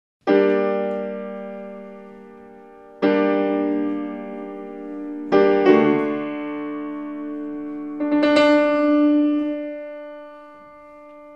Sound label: Liszt Piano Recital Bellini Norma live